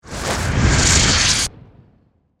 Magic Spell Build, Horror, Magic, Spell, Build, Up